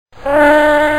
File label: skill bee